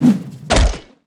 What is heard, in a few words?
Metal elf Shield guard Attack